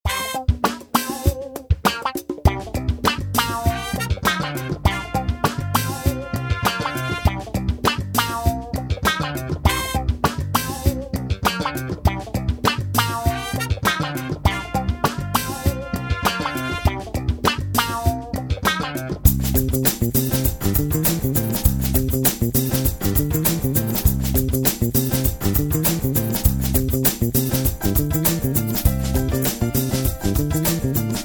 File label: funky latin